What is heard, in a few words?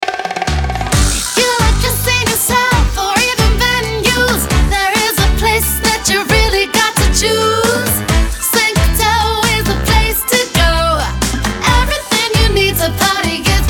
Jingle